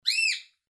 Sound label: cockatiel